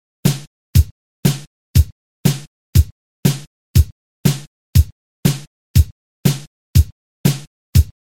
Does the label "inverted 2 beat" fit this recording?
Yes